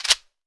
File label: chekere; Africa